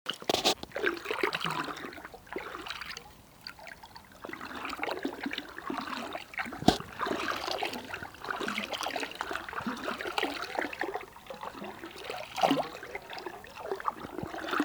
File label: Flowing Water